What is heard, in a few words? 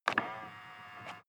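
sports car interior side mirror adjust short; verkehr transport; autos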